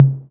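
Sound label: mid Tom, Electric Kit; Akai MPC2500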